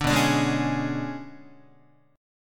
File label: B Minor 6th Add 9th B down Guitar 4A 4E 5A 5D 5G sharp 6C sharp; Bm6add9 strummed; JGuitar